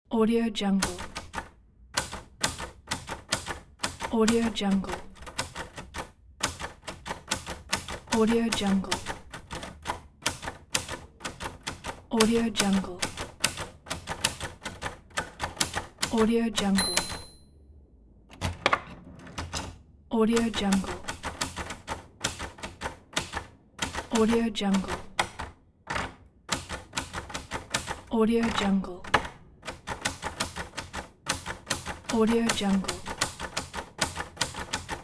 typewriter sound

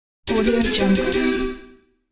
jazz vocals

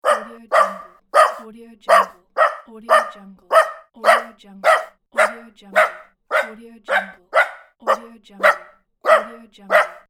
small dog barking

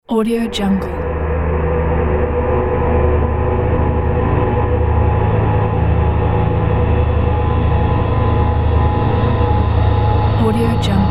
drone

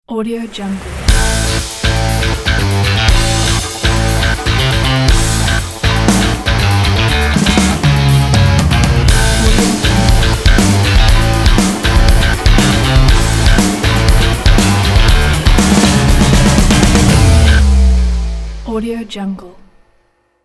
logo sport rock

